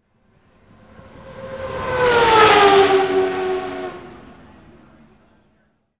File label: CARS